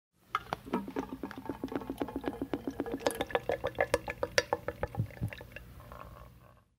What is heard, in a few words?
oil in, mechanic